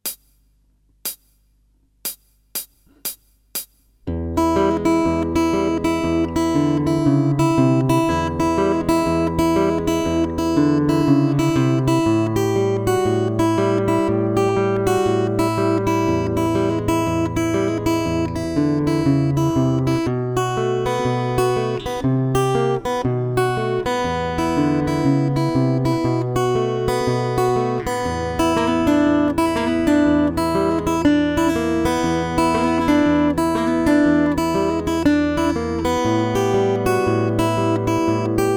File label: Fingerstyle Blues, guitar